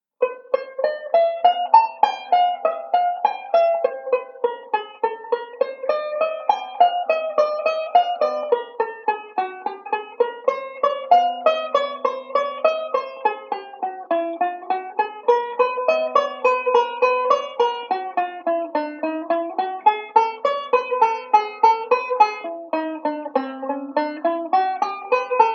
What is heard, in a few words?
slow, chord melody; Beyond Chord Melody Volume II